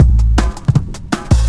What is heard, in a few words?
break